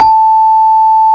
CELESTE; CMI Sound Library Rev 1.3

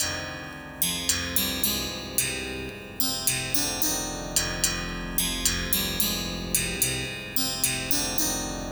Guitar Based; Eclectic Electronica

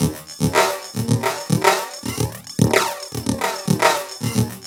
LOOP, TECHNO; TECHNO-BOX; ELEC-LOOPS

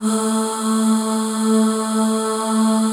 A2 FEM AHS, FEMALE AHS; Northstar - New Gold VOL-1

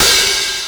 Joe Open Hi-Hat; Drum n Bass; Hi-Hat One-Shots; KJ Sawka; Drum n Bass & Beyond; Drum Tool Box

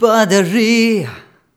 Rhythmic A

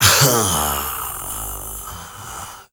male solo, male noise

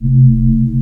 SPACE MOTOR; Q Up Arts; Dream Experience; CLUSTER

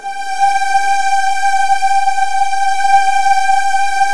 G3, Virtuoso Strings; ILIO; Kirk Hunter Virtuoso Strings VOL-1

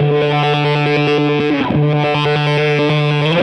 Hondo Fuzz 140 D, guitar drone

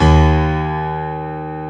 C#1 STR PIA, G.PIANO; AKAI S-Series CD-ROM Sound Library VOL-7

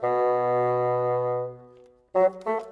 Bassoon, Orchestral; Sonic Foundry (Sony Creative Software); Orchestral VOL-2; Modern 10

